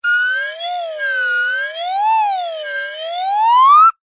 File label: cartoon slide whistle double pitch ascend